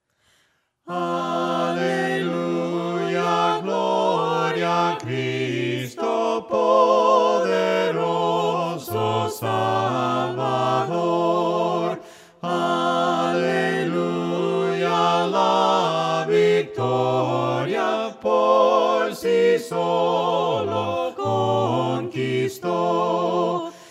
melodia palabras; Aleluya gloria a Cristo

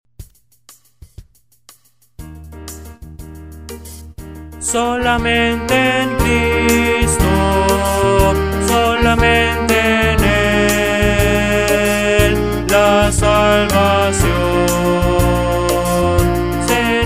Solamente en Cristo

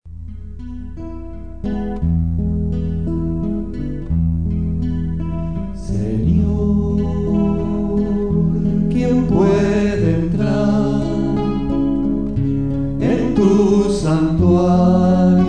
melodia palabras

